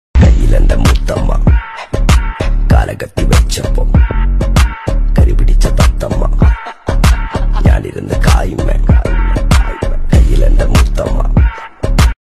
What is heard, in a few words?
malayalam rap trending song